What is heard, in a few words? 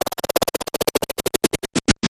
Sputtery Wind Down